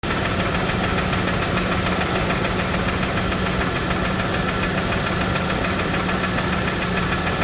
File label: engine knocking knock sound; 2.9l 3.0l V6